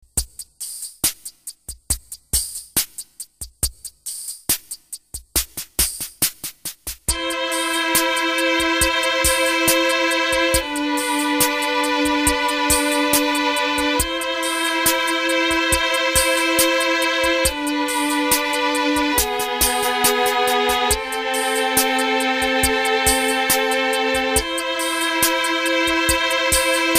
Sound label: violins; akai